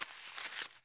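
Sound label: something with paper; neco s papirem; Mafie 1 cz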